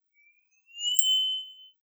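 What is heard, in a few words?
Crystal reverse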